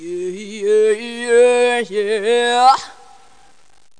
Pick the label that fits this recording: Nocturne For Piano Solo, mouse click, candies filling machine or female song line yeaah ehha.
female song line yeaah ehha